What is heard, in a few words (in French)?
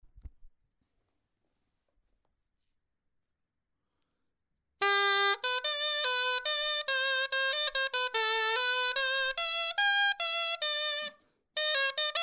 clarin etude